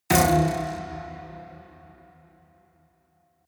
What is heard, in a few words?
haunted strings buzz